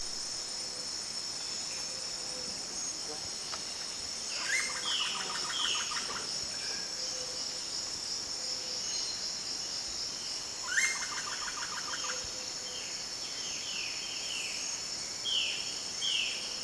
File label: Rufous headed Woodpecker